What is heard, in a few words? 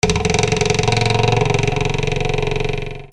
SQUEAKS